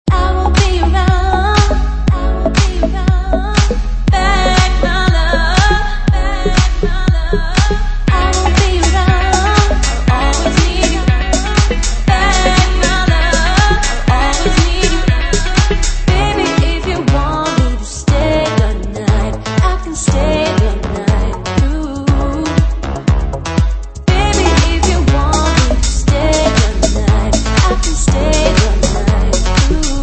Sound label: House Vocal Hits; Diamond Sounds